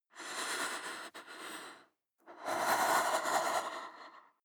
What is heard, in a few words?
breath female shiver